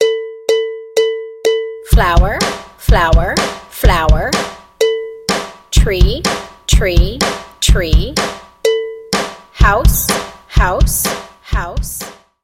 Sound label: Flower Chant